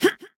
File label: basic attack